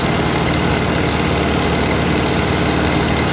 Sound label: airplane level loop